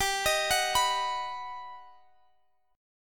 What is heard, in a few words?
G Augmented Major 7th, Guitar, Half Step Down